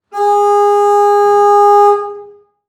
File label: Transportation Patrol Boat Honk Horn